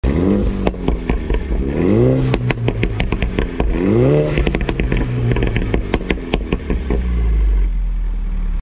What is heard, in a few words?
Cooper exhaust